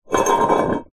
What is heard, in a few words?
household cup metal camping slide wood counter; zapsplat